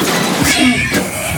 raptor die

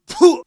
minor pain, soviet

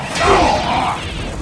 die; spacemarine

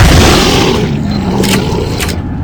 bloodsucker die

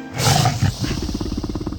raptor idle, raptor

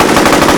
fire loop

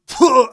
minor pain, soviet

